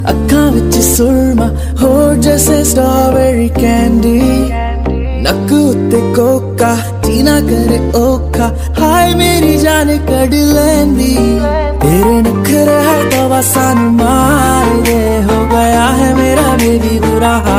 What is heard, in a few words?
sad status ringtone, bollywood ringtones; whatsapp